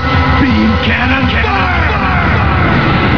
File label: beam cannon